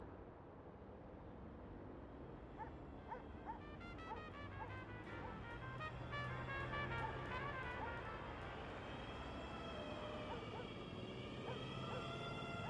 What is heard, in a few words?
ambience General; netent; jackhammer